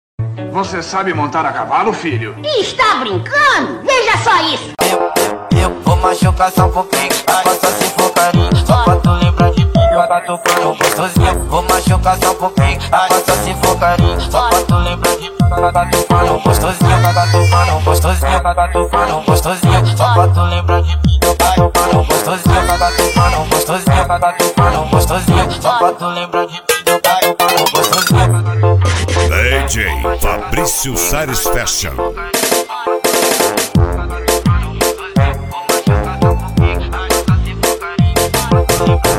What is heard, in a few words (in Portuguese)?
deep e funk antigo